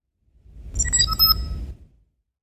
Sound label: door, doorbell; SFX: door chime; tng